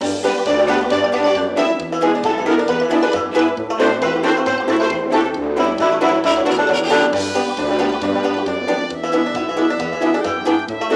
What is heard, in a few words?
hoedown vinyl; Don't Starve Giant Edition; PS Vita